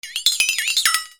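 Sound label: percussion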